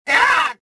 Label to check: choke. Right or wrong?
Right